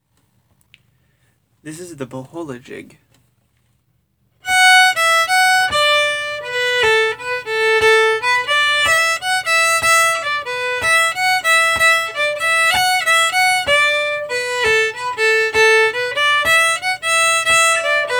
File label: Fiddle Jig Slow; The Bohola Jig